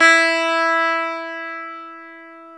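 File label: E 12 String guitar; Club-50 - Foundations Roland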